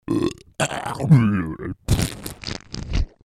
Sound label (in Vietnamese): am thanh tieng non mua khi say ruou